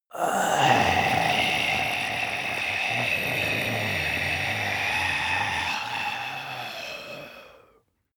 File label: zombie male exhale throat